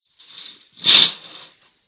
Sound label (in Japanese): レースカーテンを勢いよく開ける音; curtain